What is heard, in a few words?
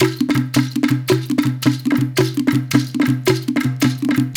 110 Hawaiian, World Dance Beats; Spectrasonics - Supreme Beats